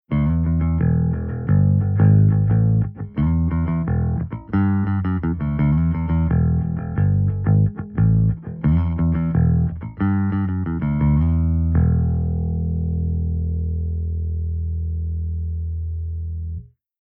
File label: SVT HIGH